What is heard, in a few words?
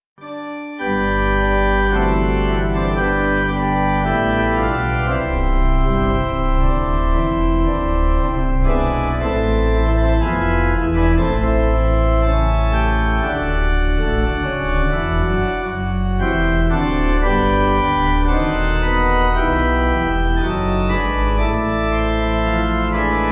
Pipe